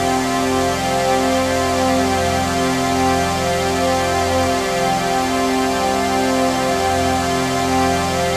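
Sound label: trance pad; Best Service; XX Large - Pads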